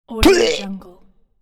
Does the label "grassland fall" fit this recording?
No